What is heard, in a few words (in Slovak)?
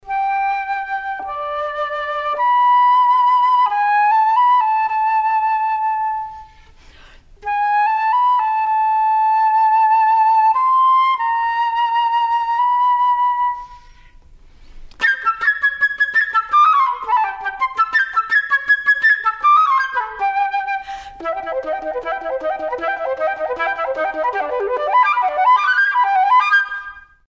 Flauta